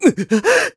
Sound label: Multi Gasp; slurps his tongue; Link's multi-gasp when Ghirahim slurps his tongue; Skyward Sword; zelda